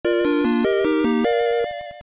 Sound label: Level Up Get Item; Zelda; AOL